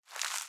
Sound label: Rope Misc